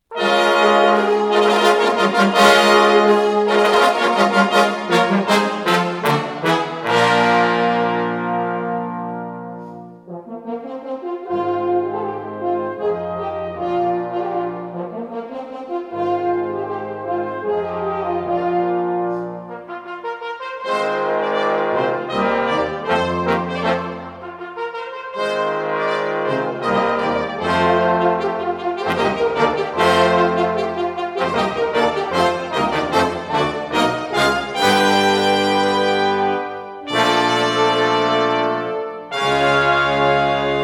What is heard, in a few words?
fanfare; La Peri